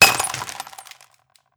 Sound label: glass Shat, CRNKL